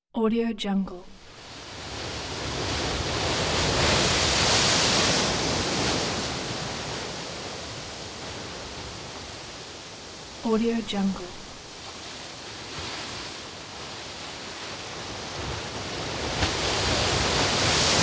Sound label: night ocean waves